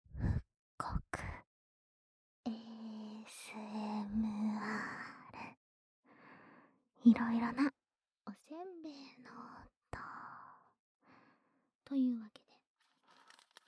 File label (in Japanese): ASMR 色んなお煎餅の音